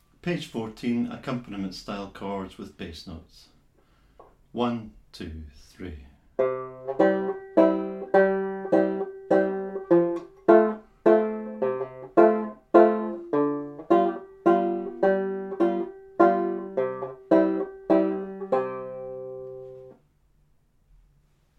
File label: accompaniment style chords; Bradbury Studies